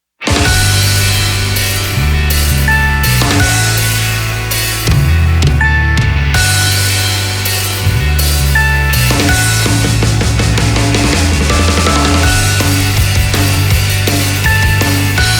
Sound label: Off vocal